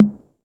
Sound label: low mid tom g sharp key, Royality free tom sound tuned to the G# note. Loudest frequency: 258Hz